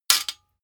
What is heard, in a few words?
sword metal hit ring clank